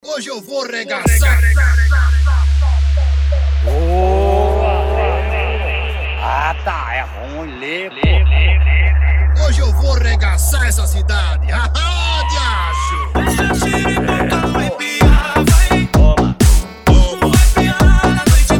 sertanejo remix

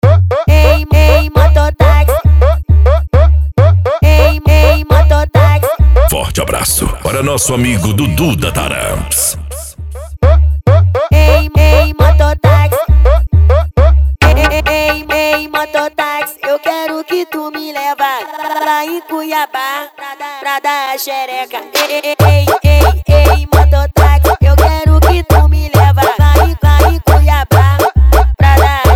especial funk mega bass

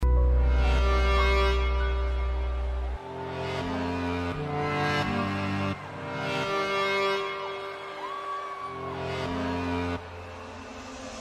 funk conciente e hip hop nacional

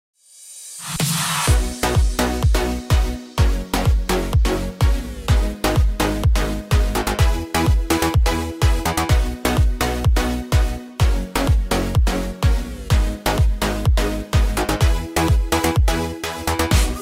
sertanejo remix

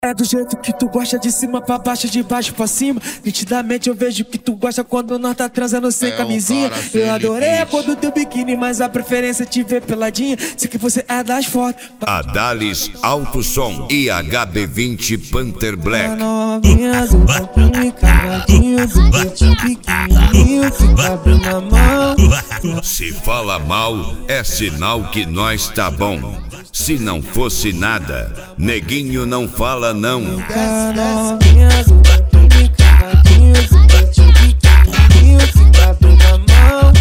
esp de cavalgada

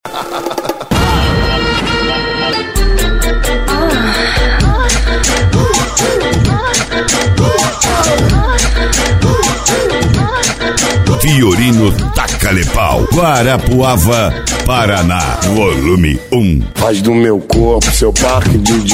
especial funk e electro house

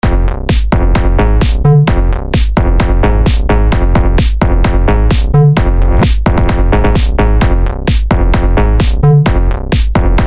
base mega funk mista beat house tum dum dum; pack bases mega funk 2020

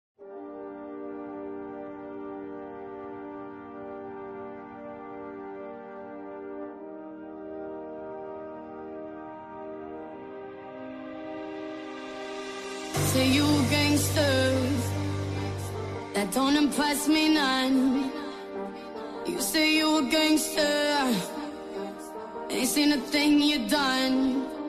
graves bass

